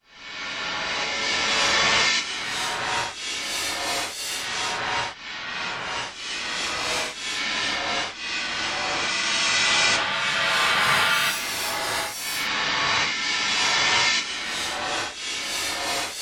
reverse cymbal

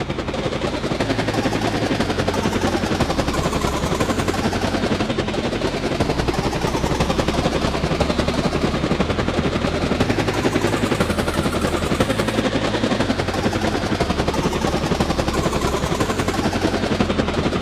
helicopter bach

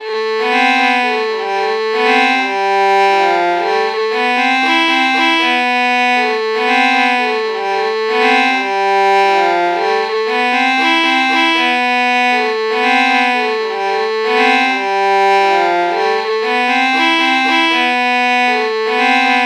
violin violin lpc false melody morph low

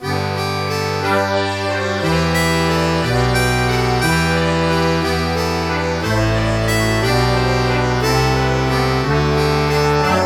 bandoneon samples

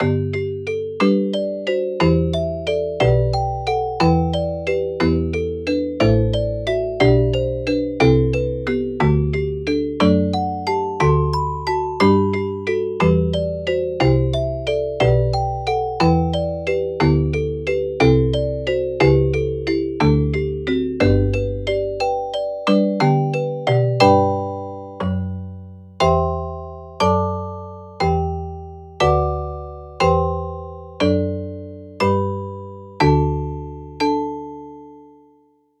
celesta

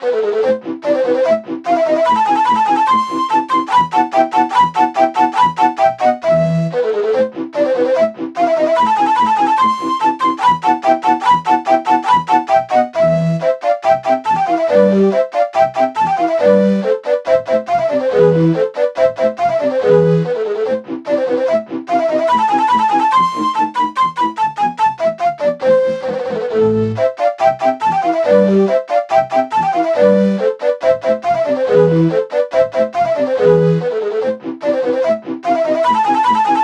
shakuhachi

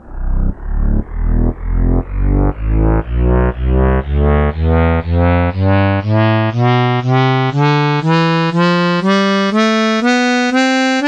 harmonica tonleiter

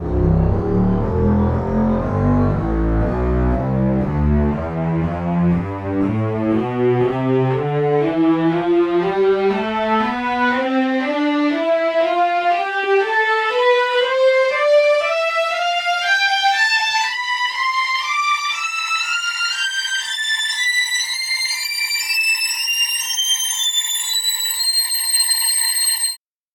strings; tonleiter

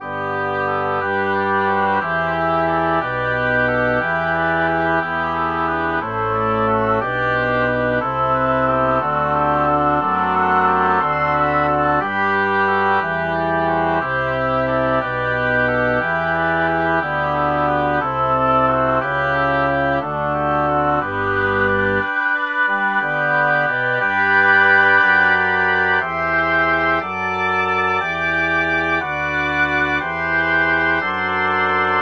oboe

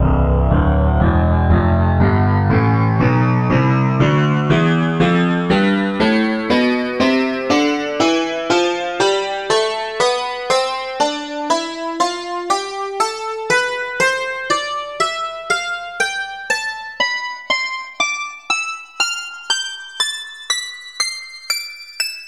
dulcimer tonleiter

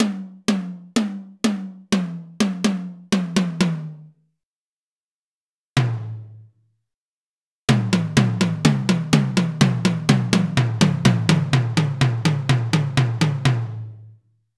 melodic tom

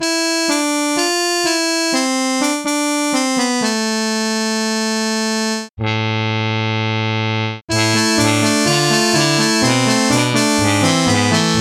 muted trumpet samples

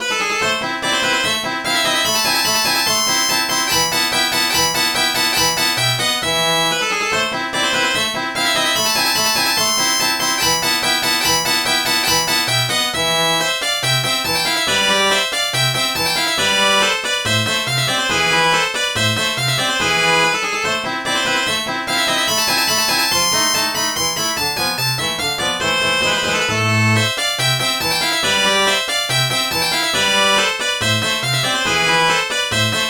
contrabass

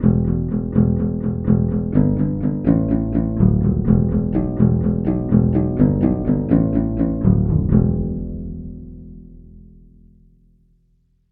banjo bass

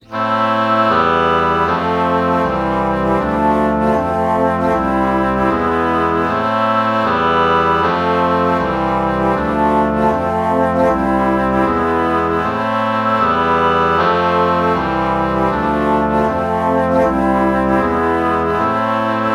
alto flute oboe chord morph

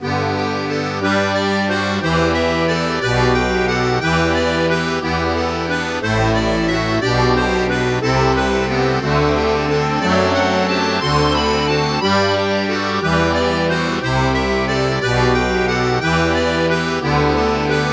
bandoneon

